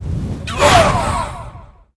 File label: shade attack